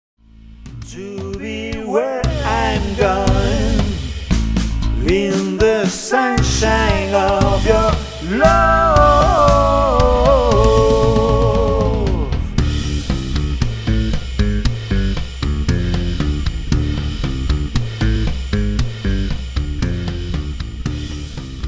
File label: No Guitar